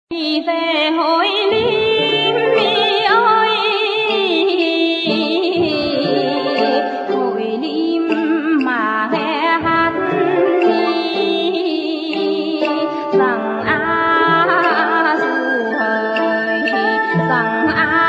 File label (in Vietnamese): quan ho